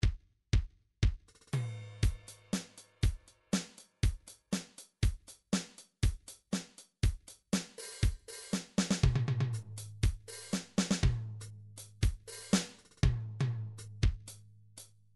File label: drums dance, midi drums